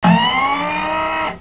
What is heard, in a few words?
gate